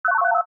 Computer Bleep: Indicating Activity; Beeps; Multimedia; Beep Sound Effects; Beeps (Sound Effects:Multimedia); Stuart Duffield

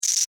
Insect Rattle Bug; Insect Movement Sound Effects; Insect Movement (Sound Effects:Animals:Insects); Blastwave

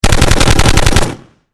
Machine Gun Fire Design; Warfare; Machine Guns

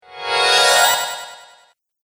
Casting Spell, Magic Sound Effects

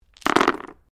Dice Small Game Board Pickup

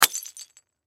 Clay Pigeon Break, Clay Pigeon Break Shatter; Miscellaneous Sport; Sports; SoundEffects-Blastwave

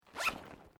Backpack Nylon Small Zip Open; common day pack; Zippers; Objects; SoundEffects-Foundation1

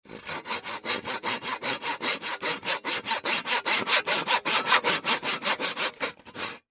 Hand Saw Cut Unfinished Wood Fast; Saws; Machines; Industry; Blastwave

